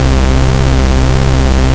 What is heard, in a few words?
hoover